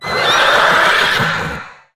Bonemare Death

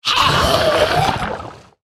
Piranha Swarmer Death Sound

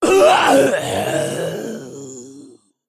female dragon death

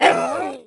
Gnoll, Death

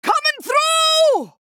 Female Dwarf Attack

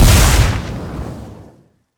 Arrow Targeted Explode

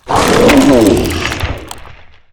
Dreadrot Treant, Death

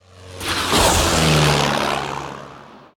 Gyrocopter Attack

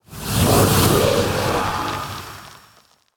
Sandstorm Elemental

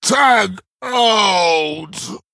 Male Troll Death

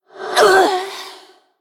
Female Forsaken Death

